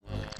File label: Weight-Plate